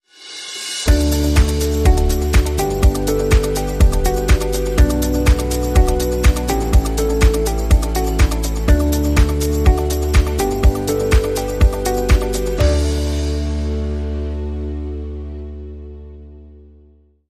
Corporate Enterprise, Sting

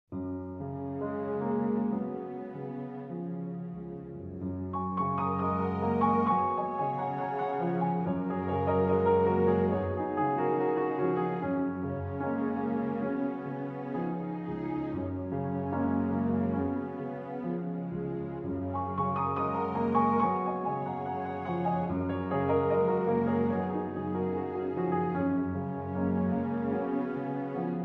With Strings

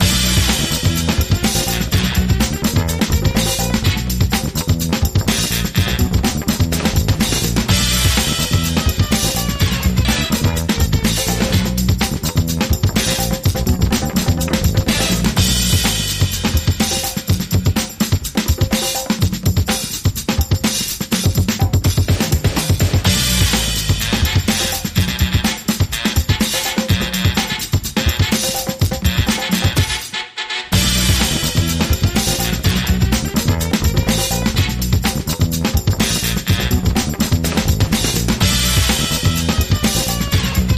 Funky Beat

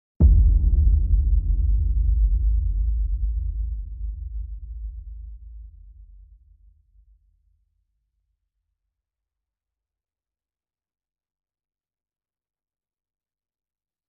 FX

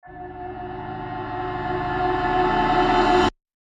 Cymbal Reverse

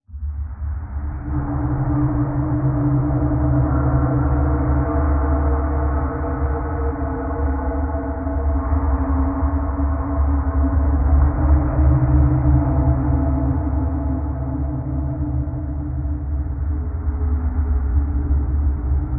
Quantum Space Station Atmo

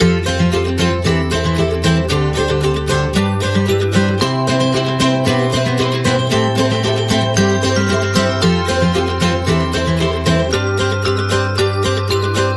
upbeat acoustic Christmas no drums

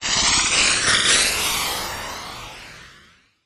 Harsh Noise Sweep

